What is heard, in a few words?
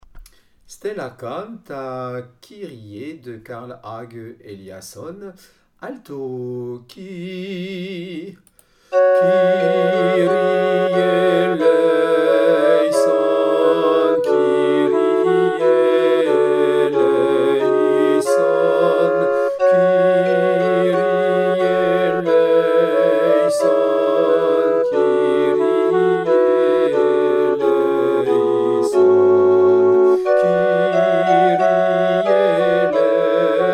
kyrie alto